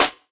Snare, jungle breaks drum sounds

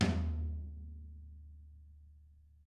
DW, Drums; DW KIT1; DW14 HIT

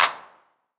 909 Clap; Goldbaby Tape808 & Tape909; The Tape 909

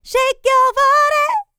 licks, vocal, dance; ILIO; Vocal Planet

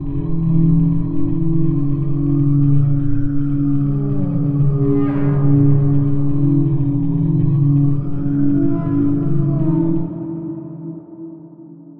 drone pain F

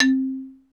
XYLO; STANDARD PRC

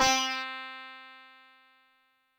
sitar, Yamaha DTXpress

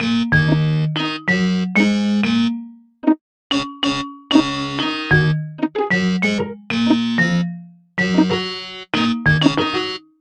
Fuzzy Vibe Melody

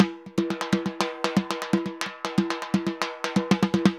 TIMBA, MERENGUE; Best Service ProSamples vol.14; AKAI

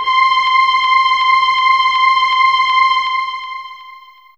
Viol Hi 2 C5, Fairlight CMI IIe Hi String; 12HiString

